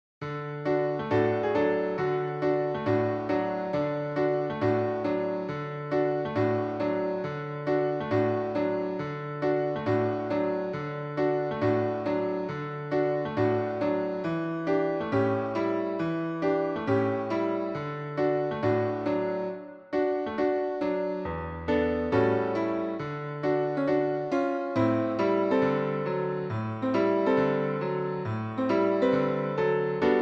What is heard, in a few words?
Rock-a-My Soul PIANO; Accompagnements